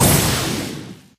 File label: atk hit